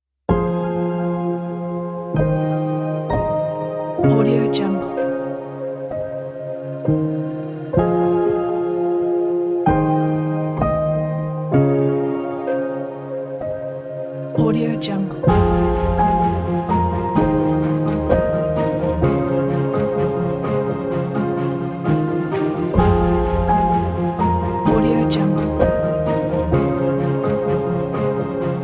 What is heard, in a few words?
sad piano